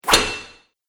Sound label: Short Sword Hits Armor; Sword Sound Effects; combat